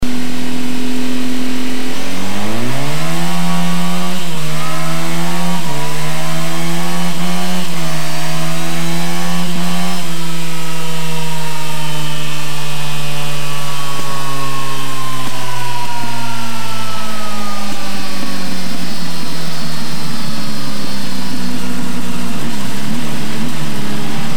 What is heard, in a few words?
Honda CB1000R kerker pipe, motoskanas